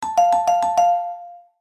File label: chime; maoudamashii